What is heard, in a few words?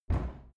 cupboard kitchen close subdued